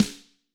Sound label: Snare Hard